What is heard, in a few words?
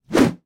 Swing; Gameplay